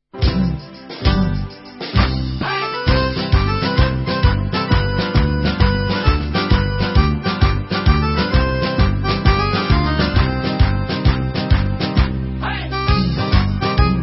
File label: Tarantella